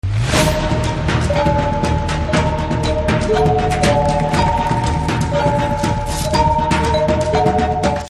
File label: Theme Ringtone; Rakshasudu